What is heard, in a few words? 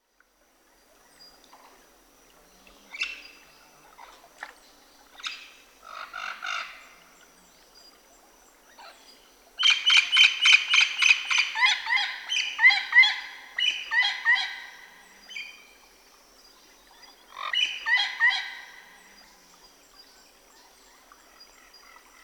Jungle Night